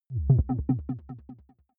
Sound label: button echo wobble